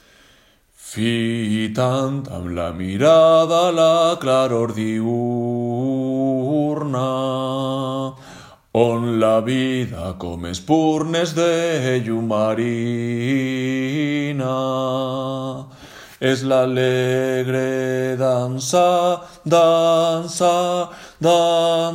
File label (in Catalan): Cançó de mar BAJOS